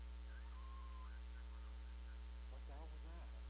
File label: Walkie EVP; COPS-EVP; Ceely Rose House; Malabar; digital